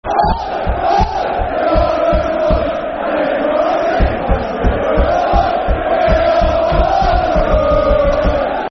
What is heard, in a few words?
Hello, Hello, Football Chant; Bristol Rovers Brfc